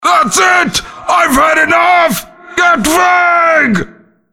Krampus death

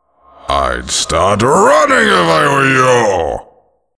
coal barrage

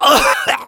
pain sharp, fr; Grognement; Heavy

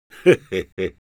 Engineer laughshort, es

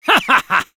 Spy laugh short

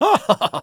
Spy laugh short

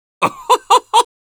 laugh happy, es; Scout

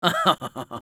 laugh short, es

